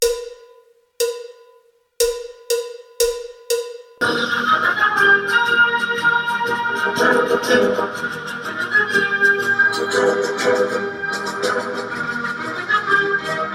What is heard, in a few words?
Gb Key